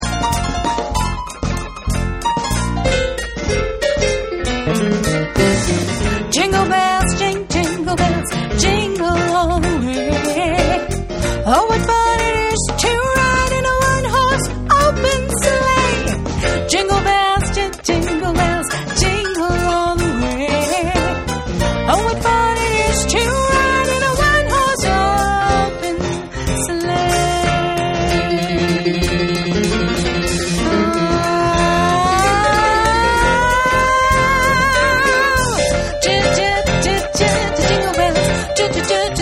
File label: jingle bells